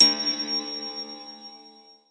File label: ting; The Art of Magic